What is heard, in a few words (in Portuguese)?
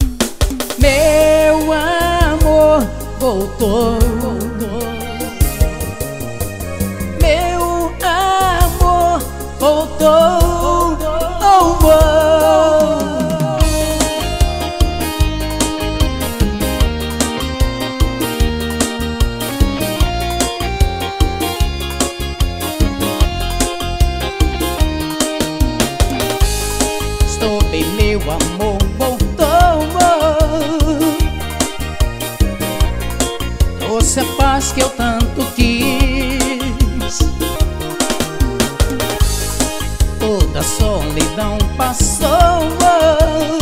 teclados